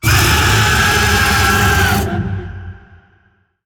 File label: Vocal Attack; Warframe; Zariman; Void Angel